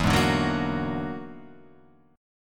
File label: E 9th Flat 5th E down Guitar Half Step Down